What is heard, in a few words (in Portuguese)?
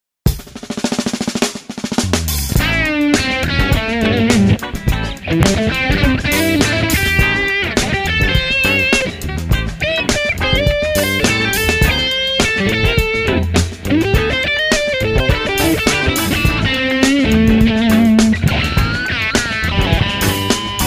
Improviso Funk Blues